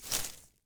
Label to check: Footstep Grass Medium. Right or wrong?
Right